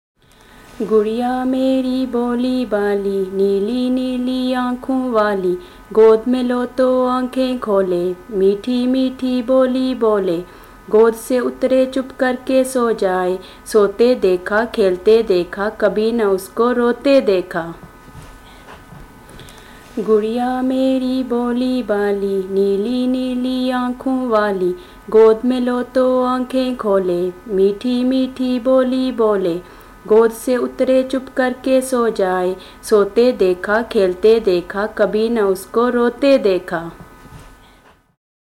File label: asian songbook the doll song